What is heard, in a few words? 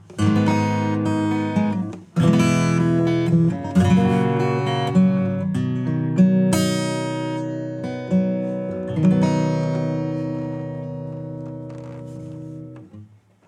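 acoustic Guitar Tone Loud; AMEK; preset ER GIT Tone Loud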